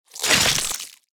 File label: tear